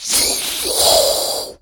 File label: zombie hiss; Sonniss GDC